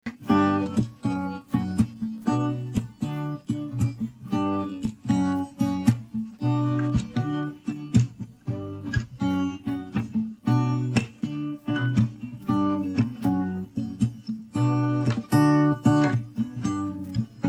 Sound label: no vocals